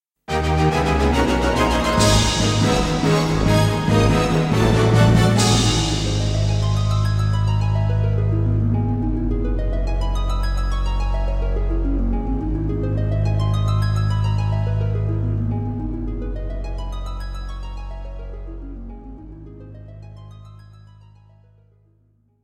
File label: complete; WoWInterface; AllTheThings